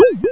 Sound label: bounce; Rebound Arena